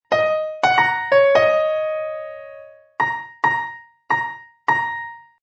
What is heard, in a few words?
Jazz piano phrase loop in D# minor at 180 bpm.; Keyboard Loops